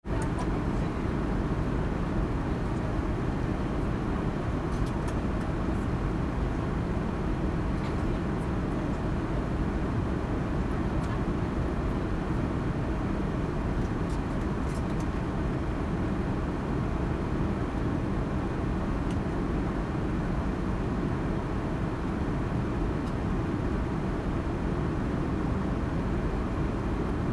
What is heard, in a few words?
airplane cabin inside